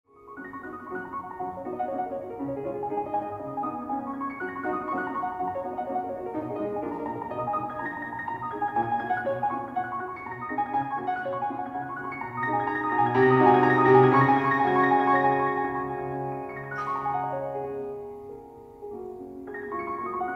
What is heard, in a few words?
Chopin Etude G Flat Major Op. 10 No. 5 (Black Key Etude)